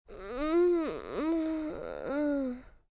Groan Tummy Ache, Moan, Groan, 1 child, tired, whimper, human voice, kid; Groaning